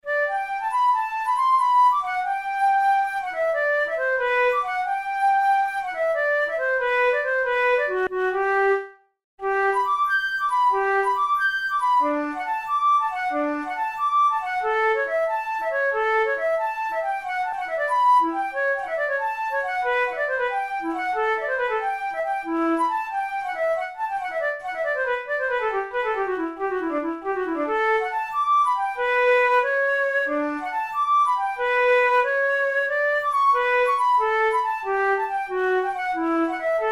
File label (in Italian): capricci per flauto traverso; ruge